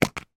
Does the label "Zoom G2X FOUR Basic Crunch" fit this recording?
No